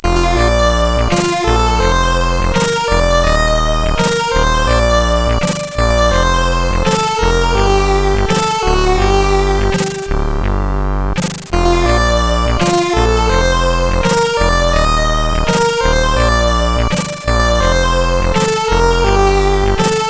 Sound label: Christmas Tune, CSG8580R5; Shade